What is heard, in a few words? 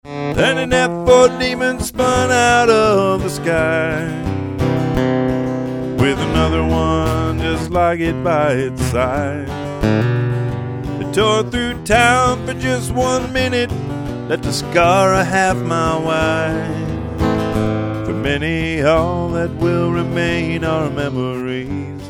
Song